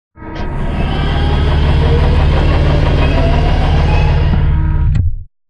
Sideways Town Giant Floor Panel Lower Rotate Servo; Boomtown; Titanfall 2